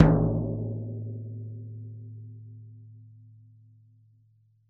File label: drum; c-evo